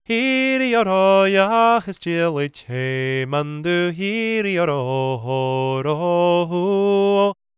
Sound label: GAELIC, World Voices; ILIO; Vocal Planet